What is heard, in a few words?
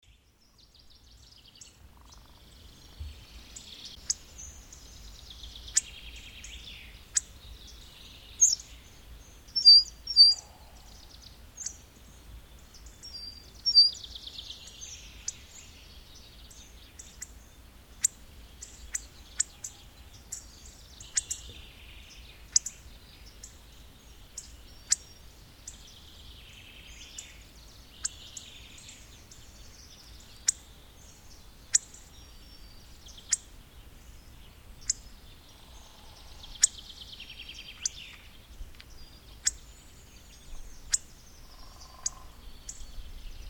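Coccothraustes coccothraustes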